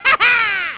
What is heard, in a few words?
Ha Ha; Bubsy